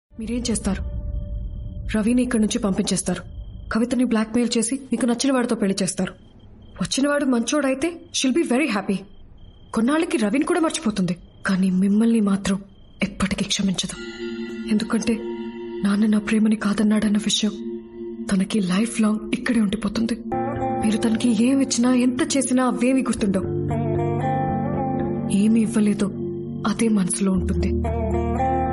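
movie heart touching emotional background music music; tholi prema; varun teaj; rashi khan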